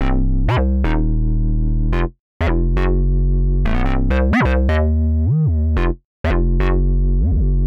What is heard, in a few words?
125 bass C; Basses; PowerFX - Nu Jazz House